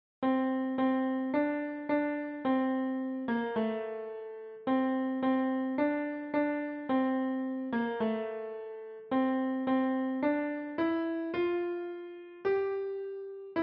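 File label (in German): We Shall Overcome, Klavier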